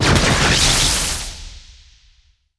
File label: Electric